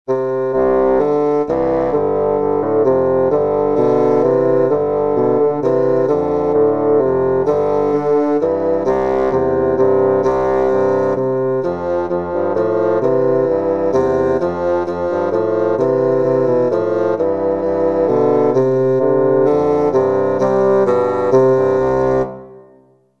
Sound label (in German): Fagott